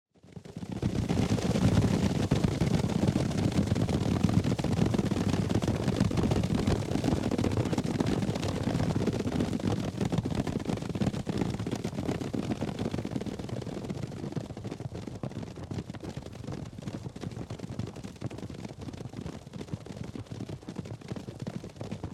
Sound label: Back Horses; cinematics; Age of Empires III - Complete Collection; Microsoft Studios